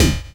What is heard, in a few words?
Minimal Perc; Minimal Percussion